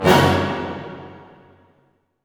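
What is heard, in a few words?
orchestra hit major; String Master Series; Dynamic Orch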